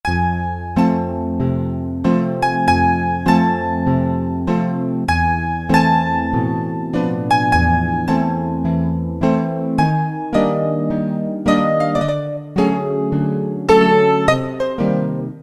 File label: Vividly Piano, Roland PN-D50-04